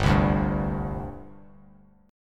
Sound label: G Suspended 4th; Gsus4 strummed; JGuitar